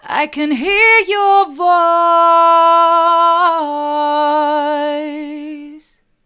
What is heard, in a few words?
i can hear your voice hard, vocal